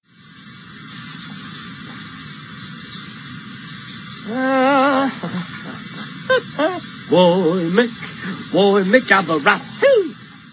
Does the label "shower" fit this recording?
Yes